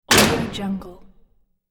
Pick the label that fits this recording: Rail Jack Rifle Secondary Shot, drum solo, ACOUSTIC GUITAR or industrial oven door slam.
industrial oven door slam